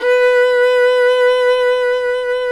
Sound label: FIDDLE NOTES, B4, R